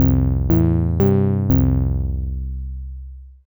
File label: bass